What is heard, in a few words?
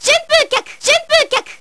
hurri; sakura